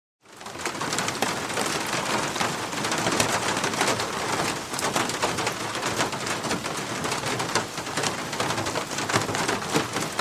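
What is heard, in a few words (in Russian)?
уютный звук по крыше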